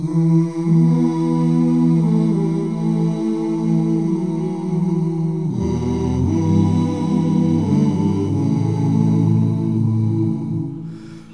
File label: voice; Sad